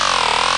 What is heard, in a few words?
stationary Paddle Wheel; N64; YOSHISISLAND